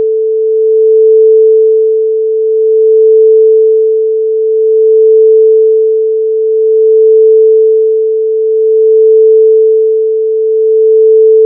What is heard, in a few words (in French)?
tournant 0 5hz